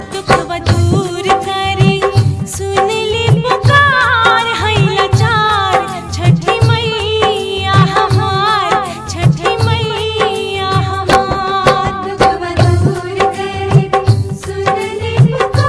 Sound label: Bhojpuri ringtone